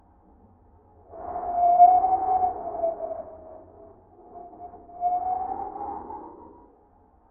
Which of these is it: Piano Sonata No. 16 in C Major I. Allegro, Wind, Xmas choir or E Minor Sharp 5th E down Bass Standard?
Wind